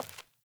Sandals Walk Default; Characters; Player

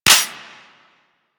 Cowgirl Pistol 2D Mech B; Tenno

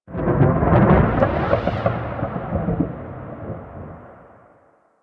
Thunder One Off, ambience; Warframe; Sacrifice; Vitruvian Memory

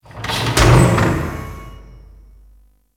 Settlement Machine One Off; Ambience; Warframe; Grineer Settlement

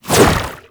Infested Hammer Idle Whoosh, Weapons

